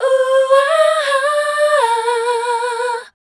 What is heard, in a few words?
MEL C2-R, Voice Spectral